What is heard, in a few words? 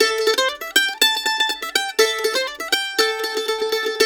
Blues Guitar Samples, lick